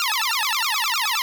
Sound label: Evil Spell, 8-Bit; Rogue Waves; 8-Bit Legend